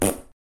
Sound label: Sharp Fart